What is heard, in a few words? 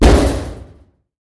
Collision Medium; Lethal Company